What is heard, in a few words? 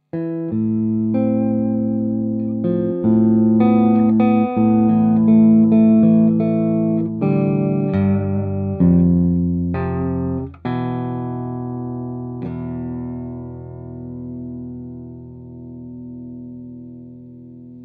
eg drive; g.rig